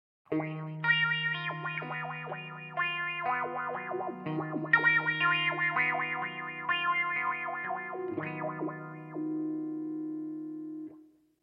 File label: wobbler wah